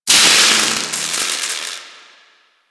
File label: Broken Light Flicker; Warframe